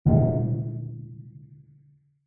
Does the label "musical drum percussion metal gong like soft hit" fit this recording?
Yes